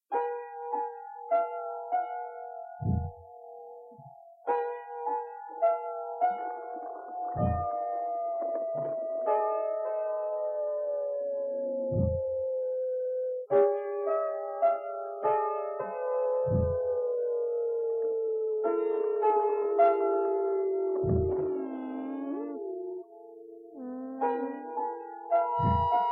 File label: electroacoustic pieces